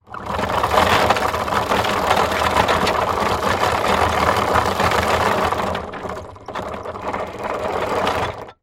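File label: sounds of anchor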